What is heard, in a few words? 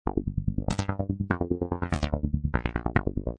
Arp Bass G; Loopology; Pumpin; Techno & Dance